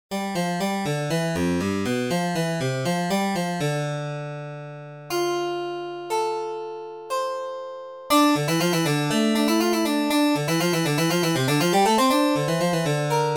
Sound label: Duel harpsichord; penguins keep marching 2005